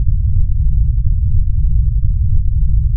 rumble rough

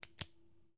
double click, c172p

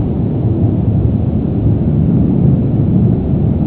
rumble

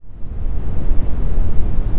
Pack In, A320; Packs in / out

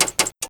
relay, Cockpit; Battery and External Power Relays; A32X

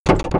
relay external; Cockpit; A32X

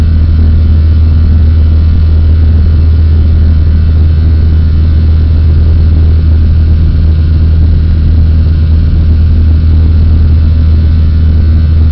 cfm hum full, CFM56B; wing fwd; A32X

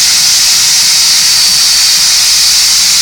V2500 idle Close, external; A32X

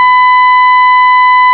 stall

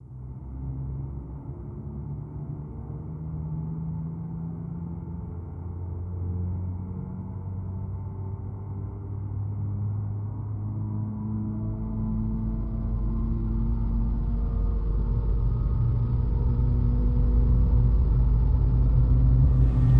start, CFM56B, wingfwd; A320

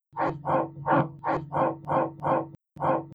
airbus PTU; A320

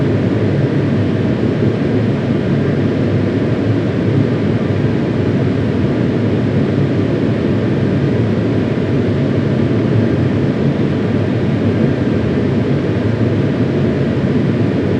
v2500 comb; A320; cockpit

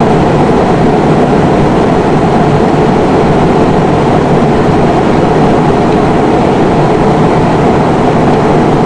wind cabin; A32X

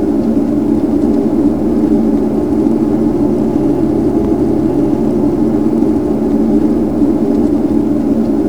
cfm comb, CFM56 wing fwd; A32X

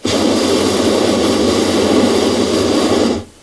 balloon sound

